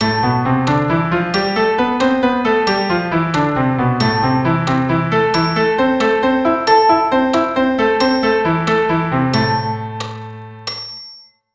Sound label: Am natural